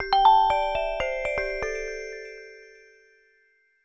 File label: Ring